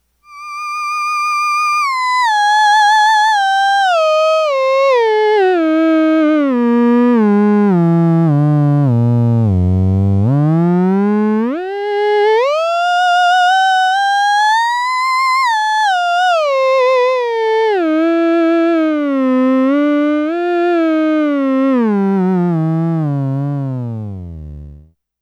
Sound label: ews new board, theremin; ews buffer exciter